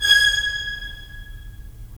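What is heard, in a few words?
strings violins; Roland - LA Composer vol. 4; 90 s Sample CDs; Violins Sh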